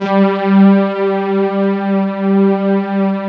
STRG G2; East West; Phat & Phunky

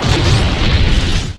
EXPLO; Best Service; Advanced Mega Effects

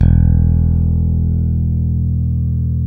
BS, E.Bass, Slap

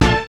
DISCO FX; Zero-G; Funky Elements

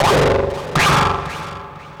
BASE, ELECTRONC

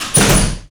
SLAMIN

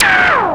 Percussive, Drums Perc One Shots

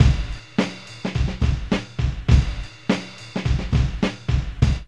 LAZY RIDE 104, Funky Elements loops; Zero-G

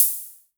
Hi Hats Open; LIMAT Div Dept

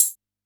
TAMBOURINE, PERC

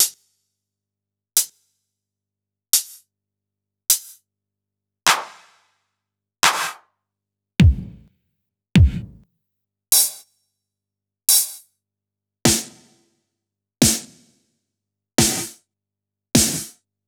Electro, Kit

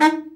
BRA Bari, SAX; Best Service Gigapack 1; BR SAX 2

